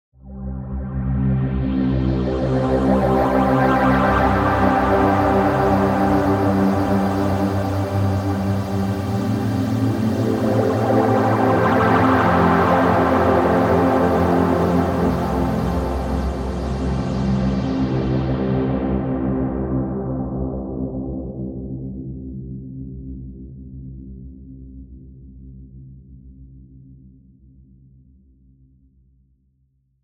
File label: Space Pad